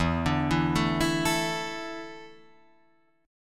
E 7th Suspended 4th, Guitar, Drop D

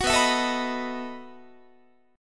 B 11th Guitar; strummed

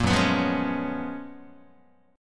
Gb Major 11th Gb, Guitar; GbM11 strummed; JGuitar

